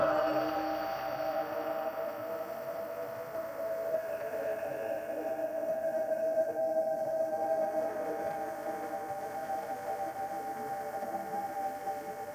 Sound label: fire crackle; sound effects